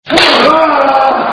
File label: hit ass